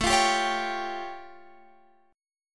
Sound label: A Augmented 7th A